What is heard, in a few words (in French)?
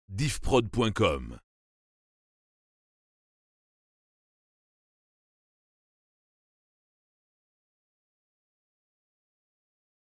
Radio Sweeps ID Avec logos